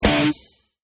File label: icon Roll; kickbuttowski; mellowbrook